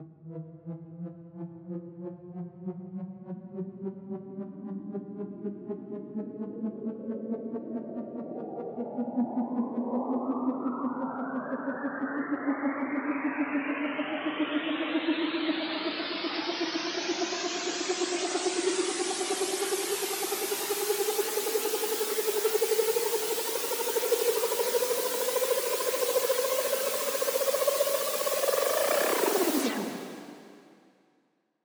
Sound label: Riser high 8 bar drop on last half bar